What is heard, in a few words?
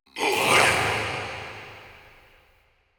Processed Battle Shouts, Viking Vocal